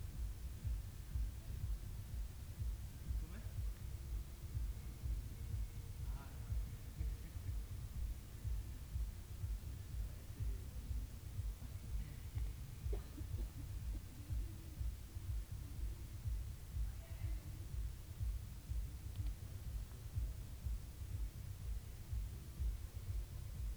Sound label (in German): dritte nacht musik